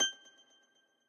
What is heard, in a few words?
win Count Up End; temple of nudges